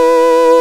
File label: FARF COMBO, Electric Organs; E-MU Formula 4000 Series Vol. 3 – Analog Odyssey